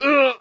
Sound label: freedom hit; STALKER; customnpcs